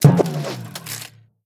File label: item remove negative; lego ninjago rise of the snakes